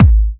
Bassdrums UK Trance Kicks; Vengeance Samples

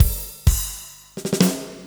Fill Ins 128BPM; Vengeance Samples

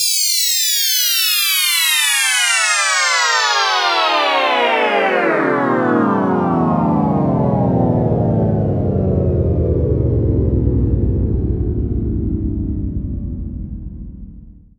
Downsweeps

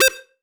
Synth Cuts; Vengeance Samples; VES1 Instruments

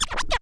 Cuts, Vinyl Record Samples

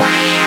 Synth Sound; Vengeance Samples; VEE Synth Shots

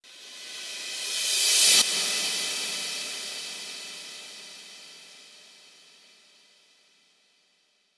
132BPM Reverse FX, G#major; Sound FX

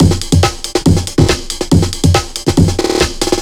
Breakbeat 140 BPM

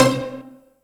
Synths C; Vengeance Samples

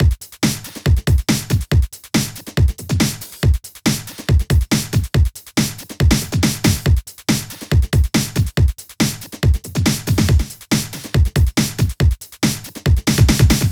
Loops BB 140, BreakBeats; Vengeance Samples

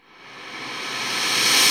Uplifter

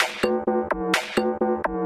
VFX2 Loop 128 BPM

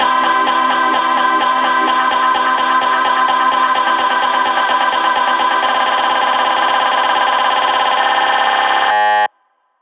128BPM Retro C, Melody Fills; 8Bars; Vengeance Samples

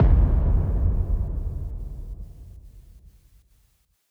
Impact FX 140BPM; Sound FX; G minor; Vengeance Samples; VTS1 Devastating Truth Kit

